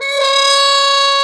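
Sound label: Plunger Mute, Brass; Roland L-CD702; Plunger & Wa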